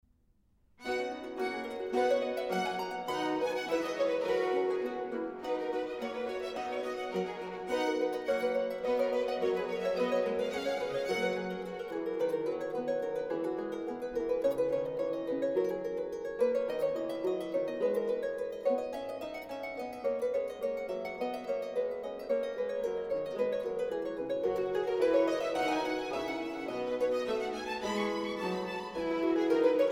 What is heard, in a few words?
Aria; George Frideric Handel; Esther 1718 version; Purim; 'Praise The Lord With Cheerful Noise'